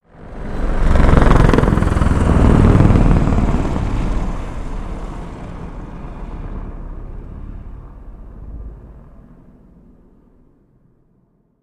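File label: Apache Heli Pass Over; AH-64 Apache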